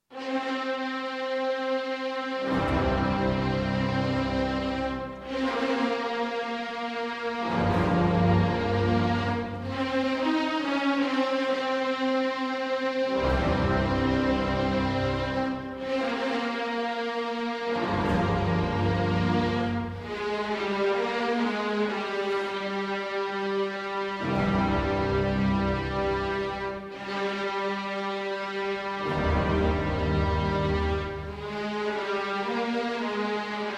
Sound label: Rhapsody in C-Sharp Minor; Hungarian Rhapsody No. 2